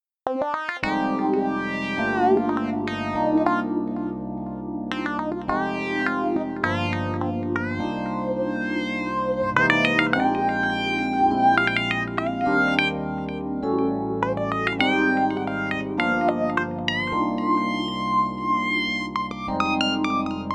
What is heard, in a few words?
Synth Lead; VIVO S10